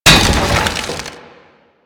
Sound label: Wood Bench Destroy